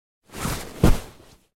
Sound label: Couch Down